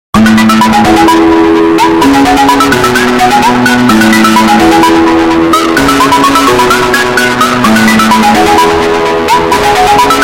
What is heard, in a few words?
Earrape